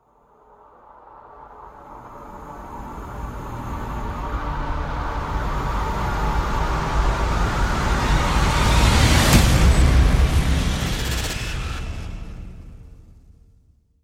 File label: risers into the void with slam